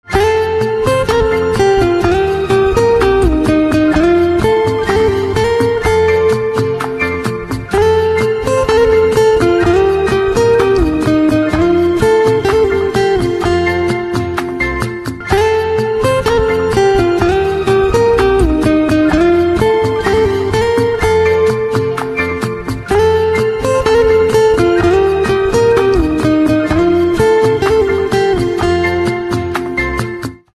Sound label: Guitar Instrumental Ringtone